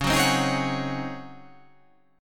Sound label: Csharp Major 7th Suspended 2nd Suspended 4th Csharp down, chord